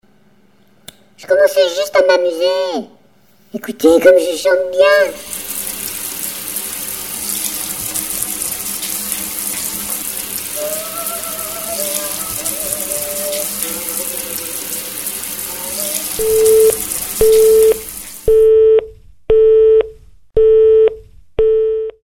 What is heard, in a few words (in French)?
douche